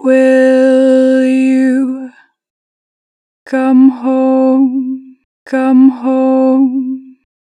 Come home, 4 bar vib, Natural Vibrato, Harmor Vocals